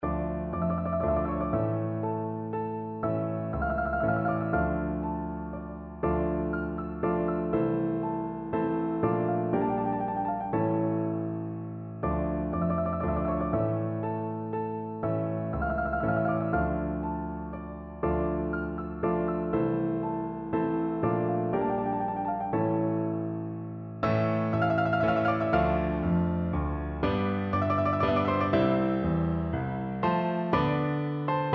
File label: corelli concerto grosso op 6 no 8, piano duet